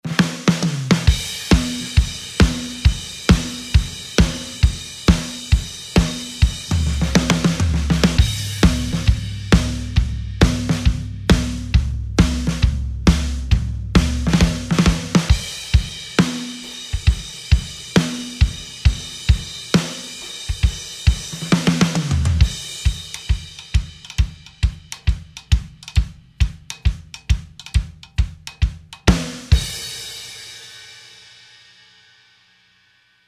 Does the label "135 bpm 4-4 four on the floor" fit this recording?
Yes